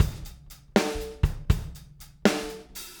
Drums, Rock

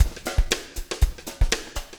breakout 120, 120bpm, loops

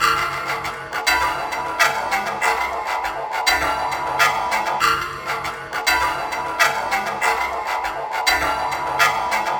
Chunky Strings

